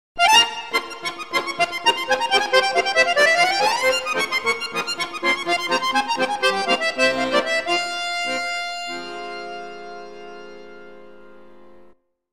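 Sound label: harmonica; Sexy Witch 4 The Dungeons and the Bungler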